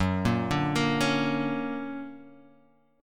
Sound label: D Augmented Major 7th, chord